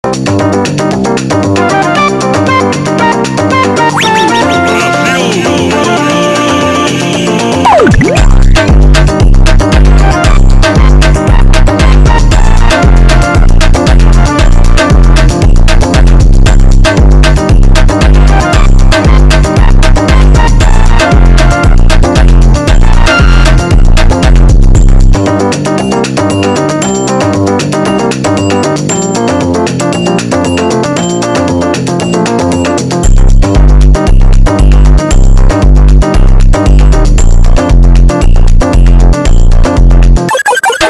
funk slowed

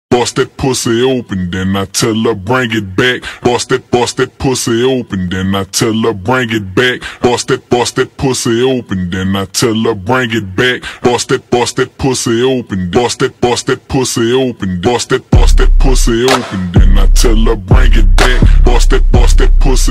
slowed bass

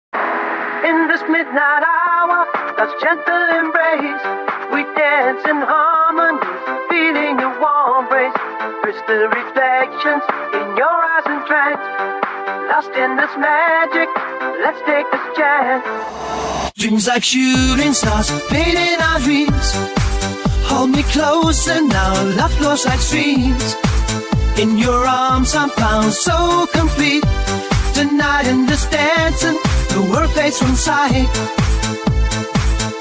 eurodisco italo disco, AI music; Udio AI

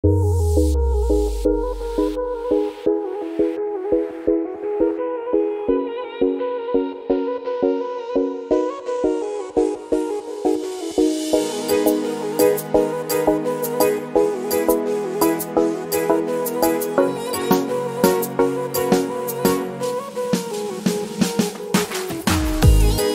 instrumental love Balkanik & Oriental music

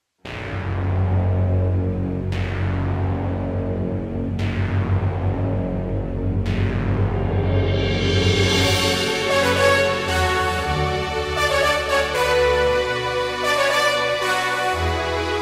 rock orchestra

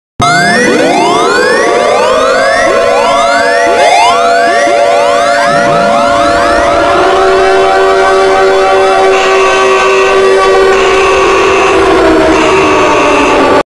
brazil eas alarm; blood moon

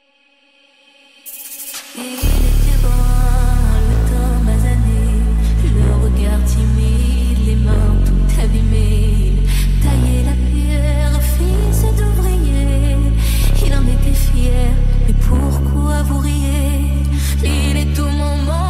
remix bass boosted

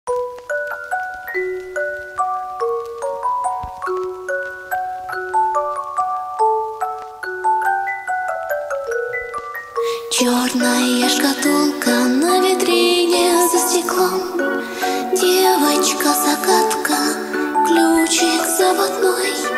music box

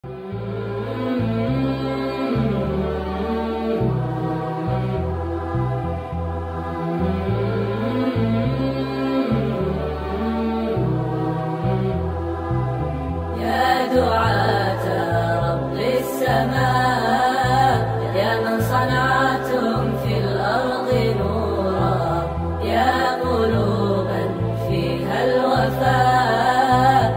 sped reverb

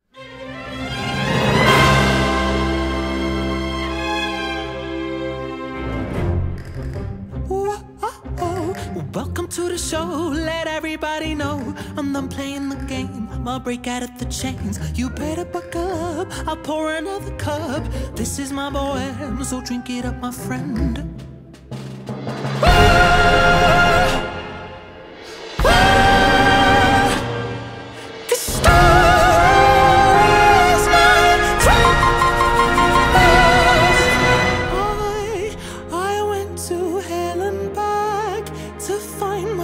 orchestral version

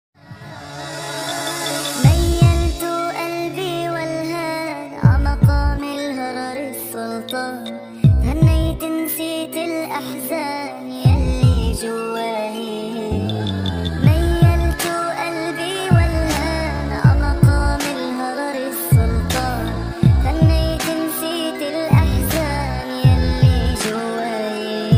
nasheed

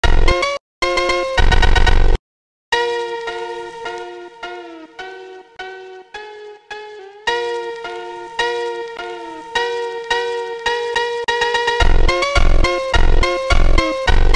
brazilian phonk on launchpad

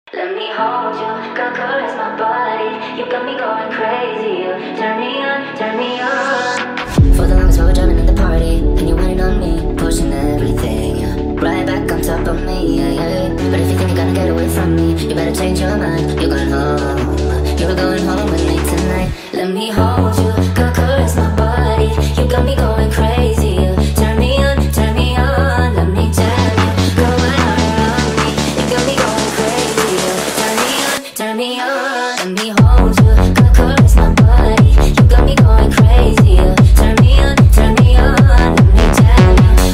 techno remix

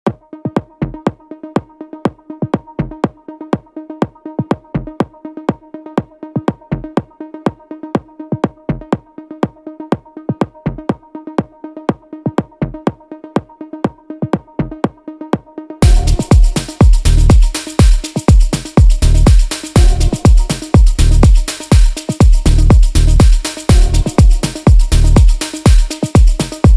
melodic afro remix

